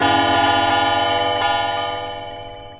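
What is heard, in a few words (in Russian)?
куранты со звоном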